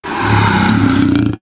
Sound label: Plesio vox